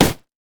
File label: blue minion melee basic attack; LoL